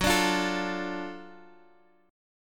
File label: F sharp Minor Major 7th Sharp 5th, Guitar Half Step Down